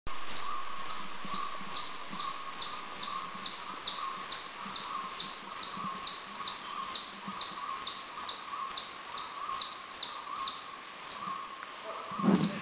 Grey-headed Canary Flycatcher, Thailand

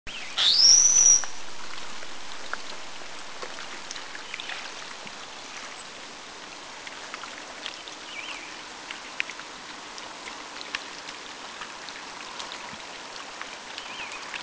Formosan Whistling Thrush, Taiwan

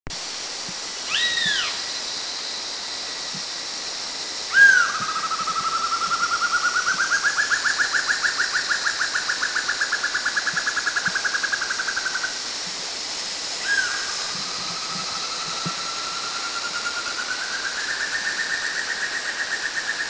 Banded Broadbill

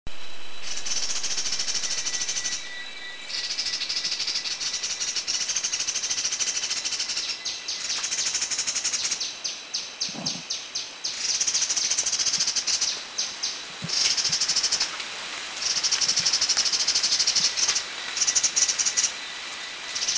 Chestnut-winged Babbler; Stachyris erythroptera; Thailand; Krung Ching

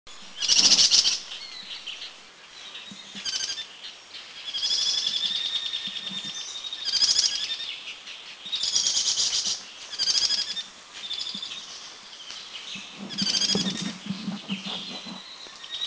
Grey-cheeked Fulvetta, Thailand

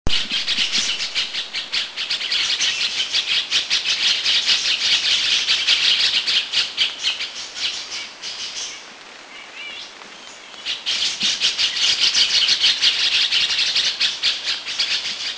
Grey-eyed Bulbul, Laos

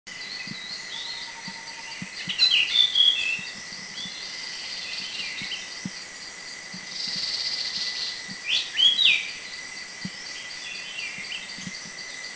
Tickells Blue Flycatcher

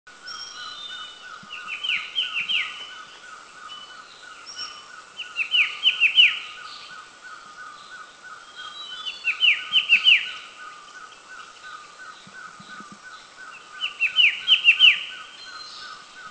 White-browed Shrike Babbler; Mishmi; India

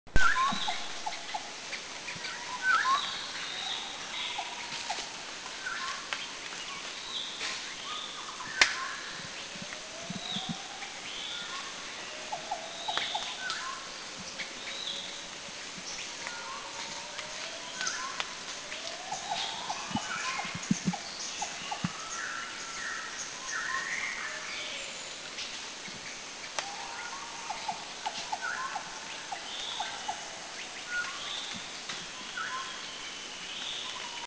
Dark-throated Oriole; Oriolus xanthonotus; Thailand; Krung Ching

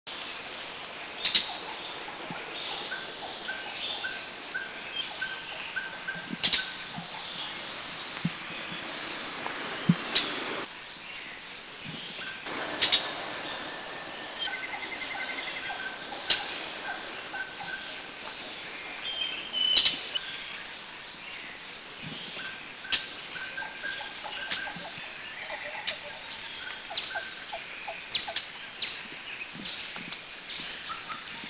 Spectacled Spiderhunter; Arachnothera flavigaster; Thailand; Krung Ching

